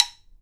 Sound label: KALAAW, HAWAII PERC; AKAI S6000 CD-ROM; WorldSounds